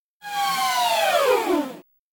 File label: Crying Motor Down, servos